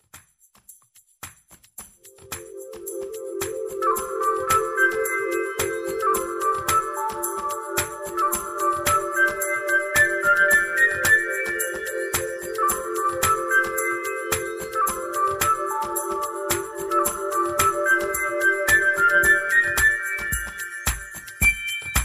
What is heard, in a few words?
Nokia flute